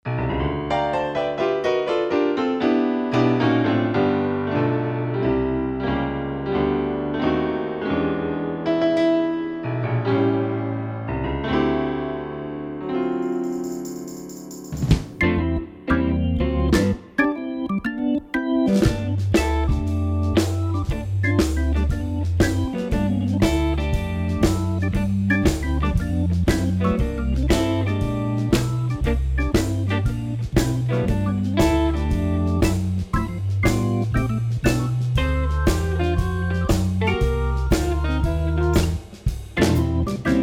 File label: Soul Jazz